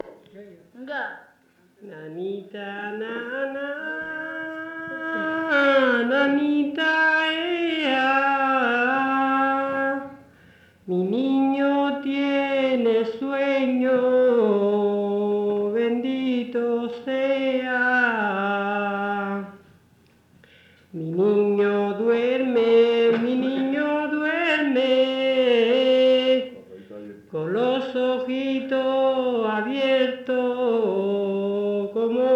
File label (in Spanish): andalucia nanita nana